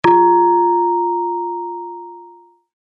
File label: tone